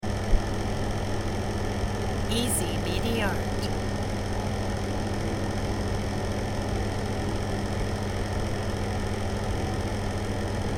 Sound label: blower